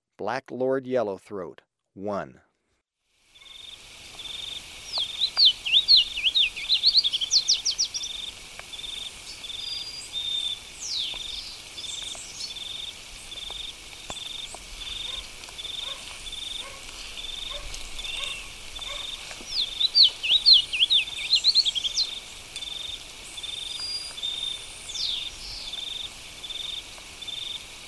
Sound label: Black-lored Yellowthroat, Birds of Ecuador; S. America